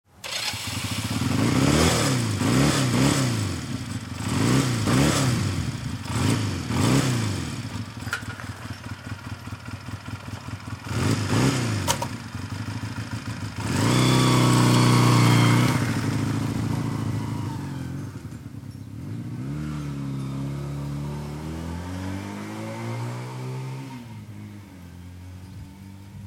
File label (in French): Depart Moto